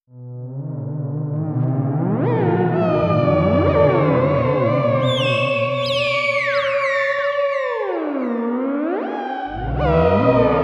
Raucous impudence from all directions, Miniature Theremin; ringtones